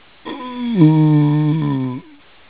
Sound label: zo idle; rezombie; svencoop